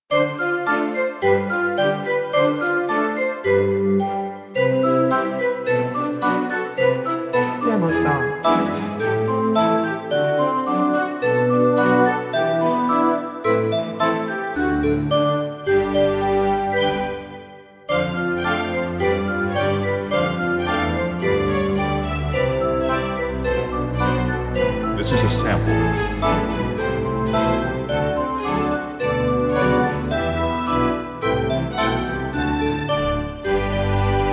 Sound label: Bouncy on Flowery Meadow